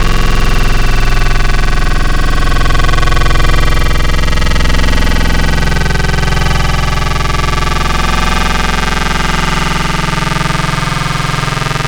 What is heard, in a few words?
Harsh Tones, Growl Wavetables; Cymatics; Virus Wavetables; Serum